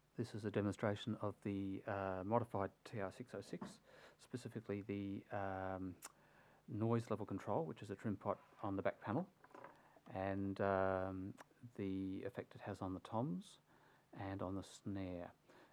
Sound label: TR-606 noise snare mods